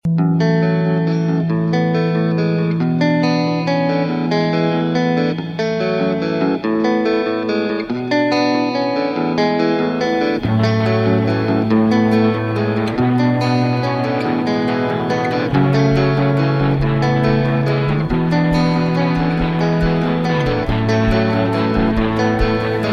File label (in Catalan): explosions guitarres curt